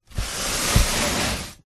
foley cardboard box push slide inside box pov; zapsplat

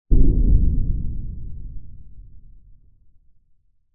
explosion distant far away

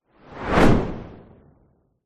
whoosh fast; sound design

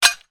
glass thick pieces down hard ground together; impacts

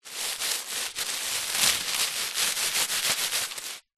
household baking grease proof paper rub flatten

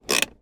car handbrake apply peugeot 307; transportation

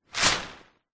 foley tree leaves branch whoosh impact designed

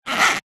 bannanas rub together single creak squeak; food

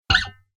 musical guitar finger move fret; steven hammon

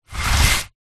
brick movement slide on tarpaulin; zapsplat

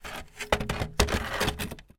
household chair wooden garden drag paving

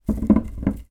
food coconut roll on table

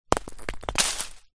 rock small roll from rockface into bushes; foley

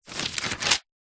household book telephone directory page thin turn

